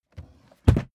suitcase swing case close

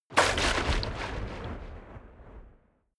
waves splash fast

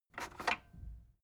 cassette eject click fast

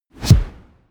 push

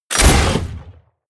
sci-fi plasma cannon fire

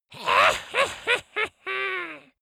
dwarf laugh evil

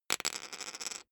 poker chip fall wobble

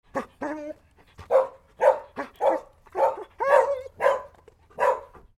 dogs barking and whimpering

